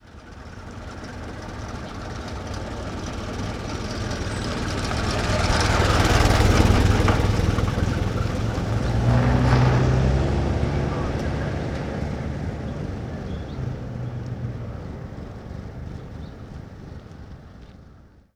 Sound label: 1924 Ford Stake Bed Truck Ext Slow By; Period Vehicles